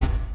error

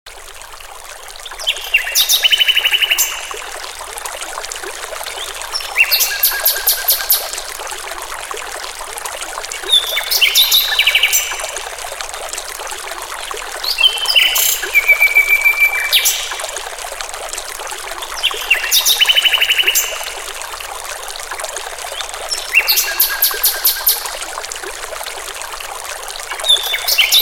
wakeup

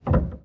piano release; samples-piano

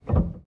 piano release

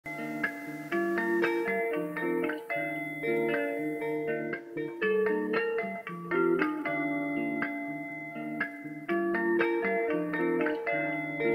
dreamy guitar loop

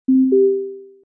messages sample

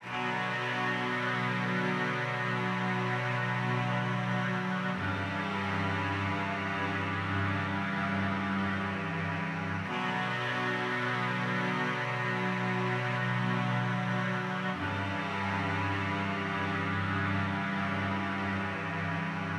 strings

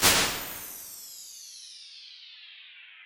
large reverb

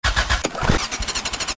start

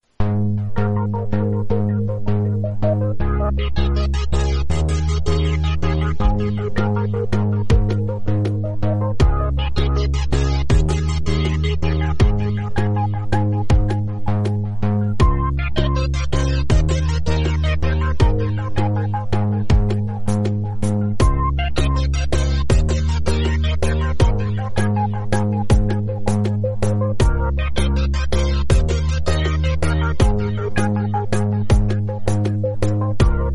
song

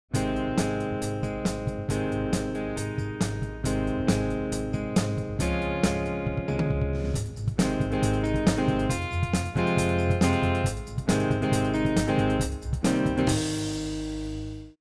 classic rock

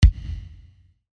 bass

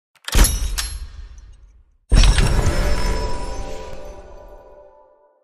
chest open; hygrounds

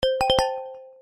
Message

